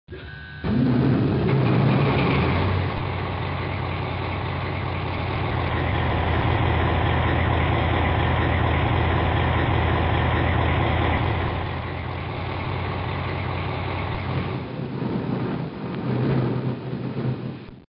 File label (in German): diesel last